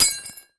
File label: wine glass bits hit